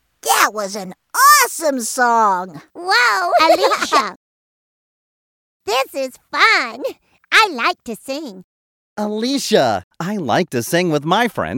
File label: Laugh With Friends